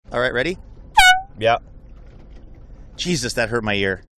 toots air horn

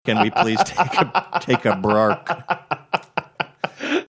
laughing can we please take a brark

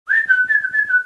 whistling to get rudy to come back

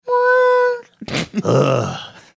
mimicking microphone feedback heavy sigh

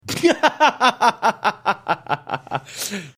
laughing

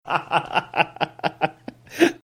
chuckling

